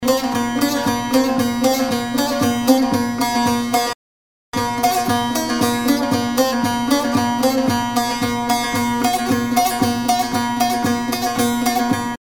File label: oriental salzer solo; music elements; grooves